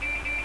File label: horn